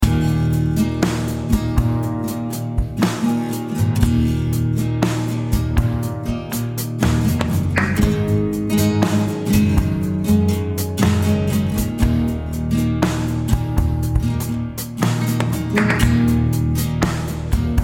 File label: Epiano; Haunt